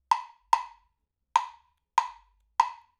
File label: Clave, acoustic percussion loop; Drums & Percussion; Percussion Loops